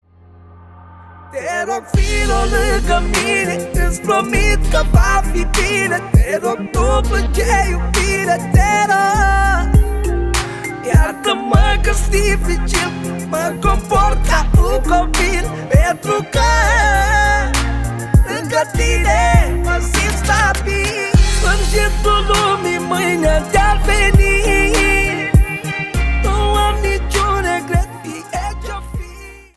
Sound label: sax